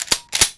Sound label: reload, weapons